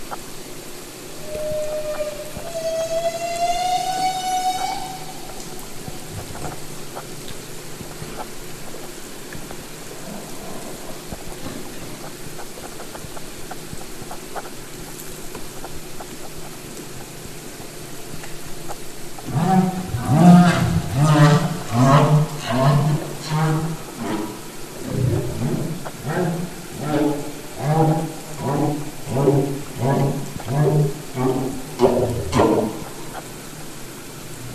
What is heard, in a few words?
humpback whale feeding call